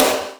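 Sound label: Crudy S, Boss DR660 snare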